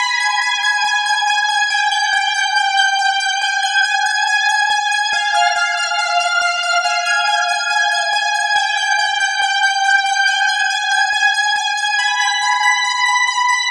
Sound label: Synth Melody; Eclectic Electronica